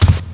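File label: footstep carpet; thief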